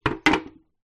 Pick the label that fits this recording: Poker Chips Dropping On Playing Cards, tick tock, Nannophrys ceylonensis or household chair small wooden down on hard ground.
household chair small wooden down on hard ground